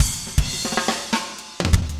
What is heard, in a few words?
funk, 120bpm, loops